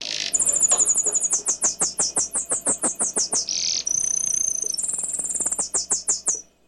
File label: Canary Call Chirp